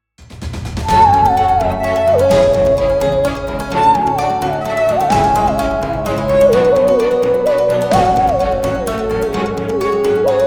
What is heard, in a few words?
NYC Subway 8AM, Musical Saw